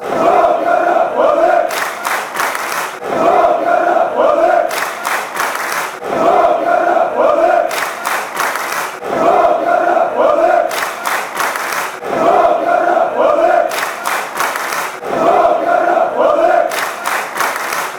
chant, fanchants; paok fc

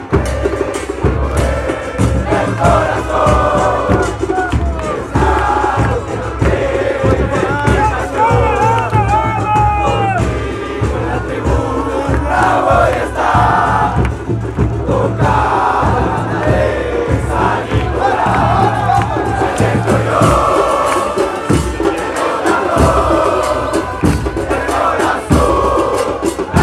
chants

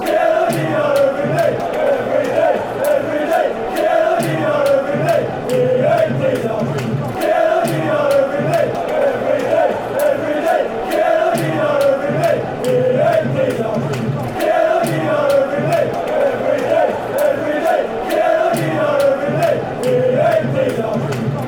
chant; chesterfield

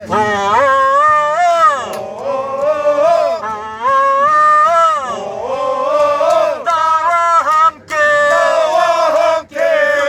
nawa hamgge seungrireul, chants; suweonfc

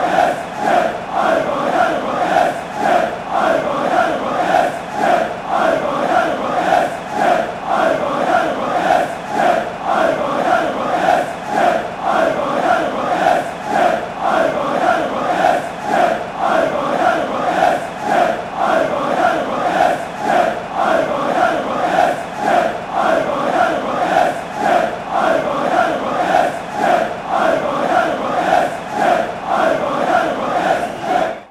allez allez, fan chants; paris sg

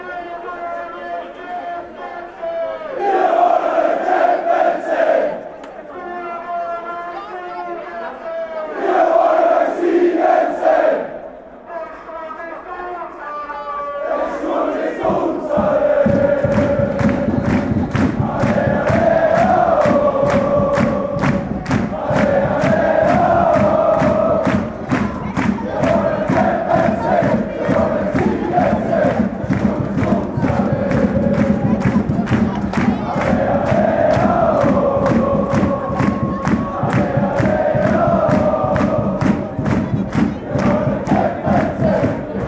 allez allez woah, fan chants

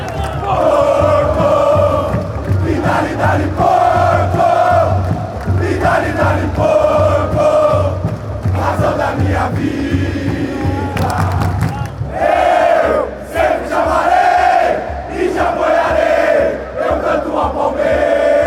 fanchants; 100 anos de historias

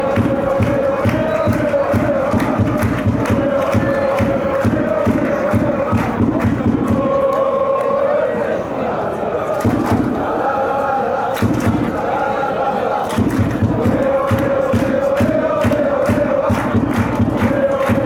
ole ole ole, fanchants

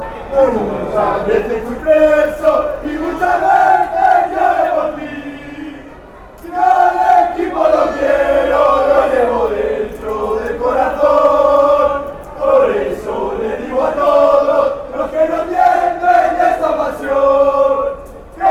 heart fanchants

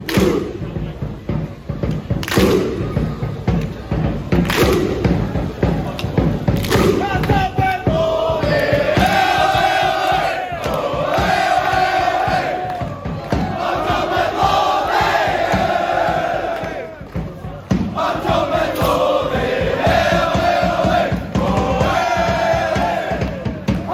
ole ole clapping, Hearts football song; heart of midlothian; hmfc

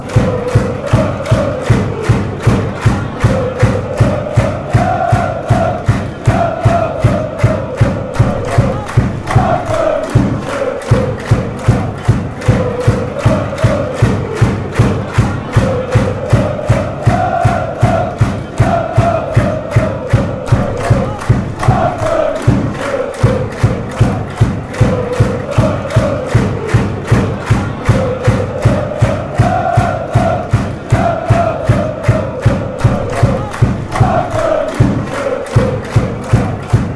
willem ii chant

